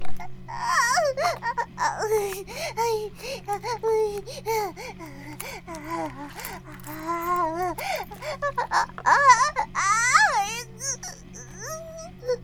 バイブ放置, ASMR

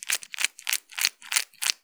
奥まで差し込んだままパンパン ループ, ASMR; ピストン運動; 激しめ

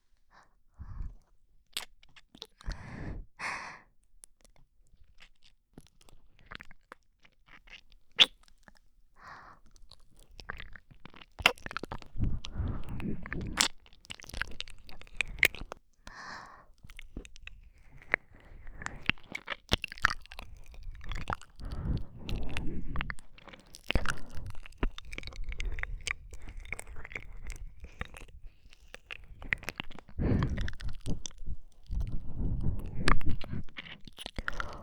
耳舐め のみ（右耳）

